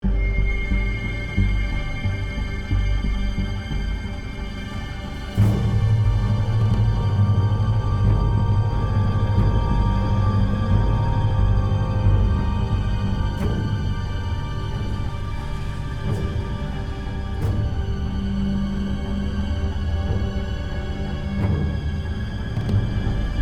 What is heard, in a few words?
Tense (Loop); The Walking Dead: The Telltale Series